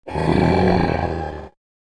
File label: Zombie idle grunt hard